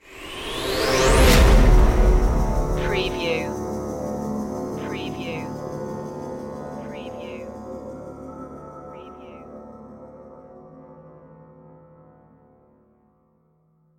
hybrid orchestra stinger

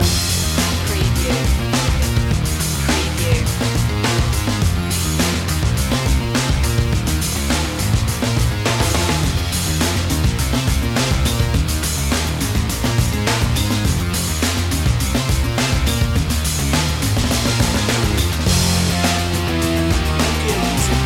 rock slam underscore loop

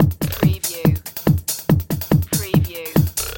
Techno-Trance Drum Loop 142 BPM; lynne-publishing

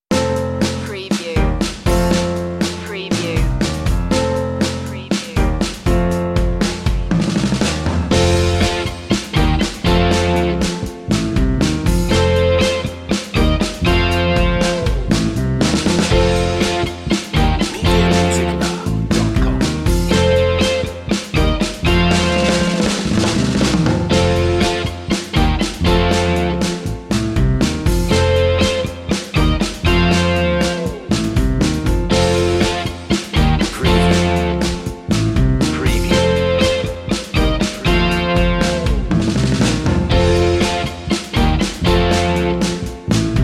motown sparkling underscore no synth

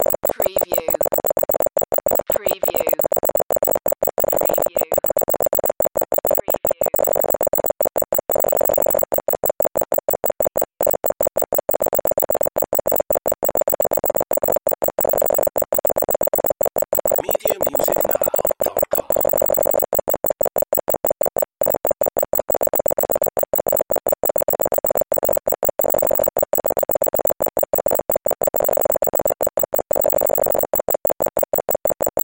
Computer Readout

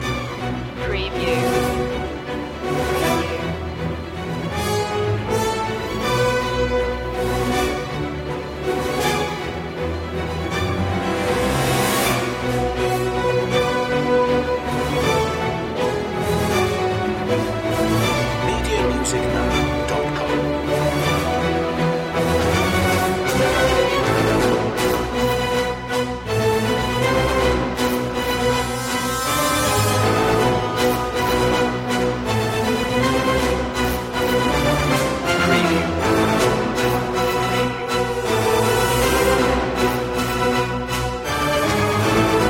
no choir no perc

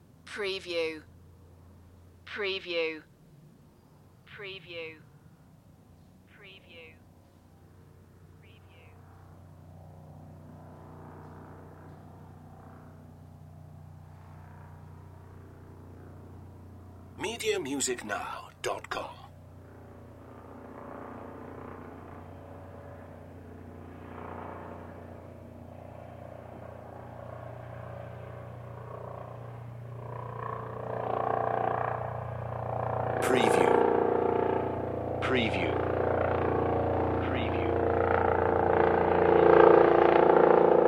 helicopter passing

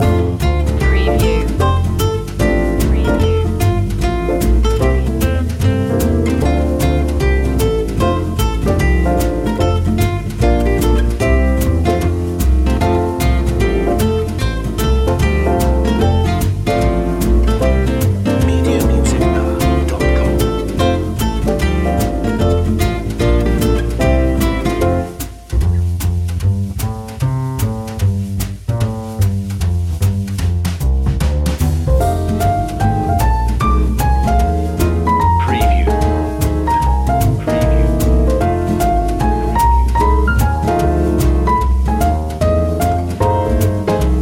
i saw three ships jazz long loop

